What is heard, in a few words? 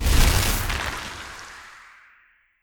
Spell Enchant Brambles